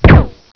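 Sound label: machine gun hit; ABUSE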